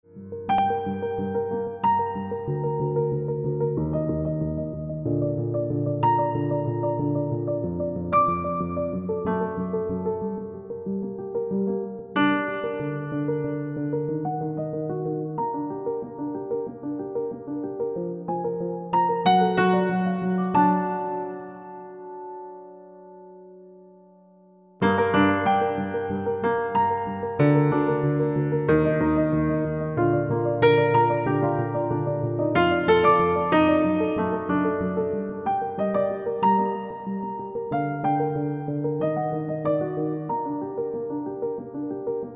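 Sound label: piano without reverb; magistral sans reverb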